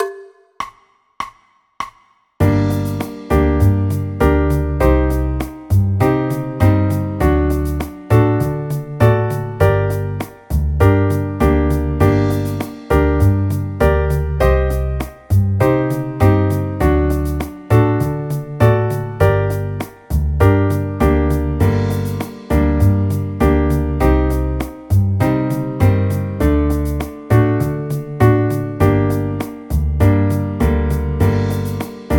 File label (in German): g moll akkordverbindungen